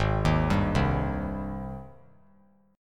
Ab Major 7th Ab down 3C 3F 3A 3D; AbM7 arpeggiated; JGuitar

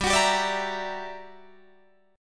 G Minor Major 13th, G, Guitar, DADGAD; GmM13; strummed; 17,21,17,19,19,20

